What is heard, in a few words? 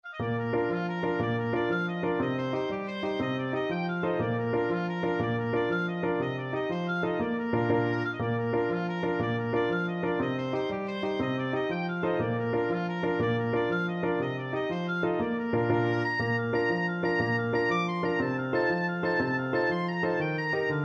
irish jig medley, oboe